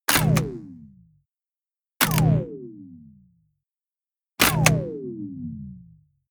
turn on off

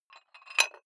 dumbbell put on rattling big weight; sport freizeit; fitnessstudio

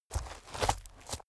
step forest turn

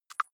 click hard low, signale

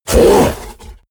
fishman roar frightened; kreaturen

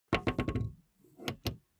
car bmw e46 exterior gas cap close

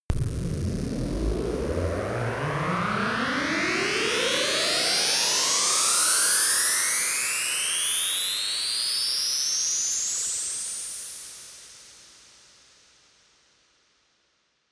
pan mover straight, Risers; whooshes rises

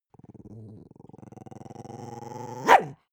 dog small growl bark; natur-tiere; hunde

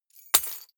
key ring large drop parquet; objekte; schluessel

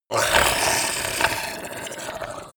yeti hiss slimy long; kreaturen

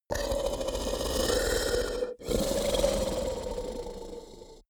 yeti snoring; kreaturen

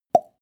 plop; soundpool

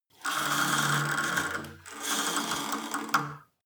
cable machine old pull release, fitnessstudio; sport freizeit

